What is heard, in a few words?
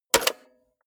cassette player slide tray take out hard